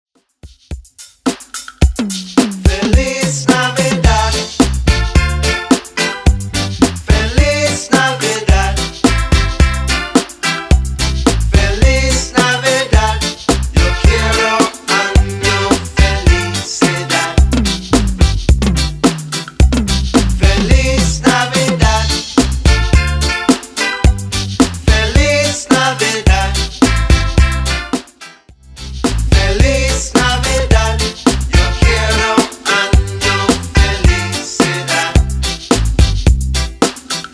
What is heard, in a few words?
Funk Redrum 108bpm CLEAN